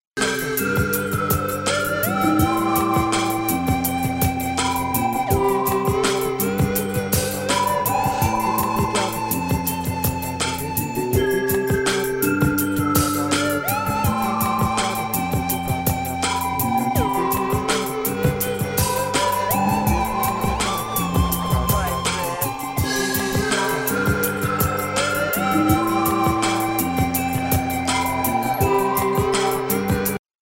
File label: Melody, RingTune